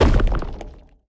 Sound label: death bodyfall; smite; poseidon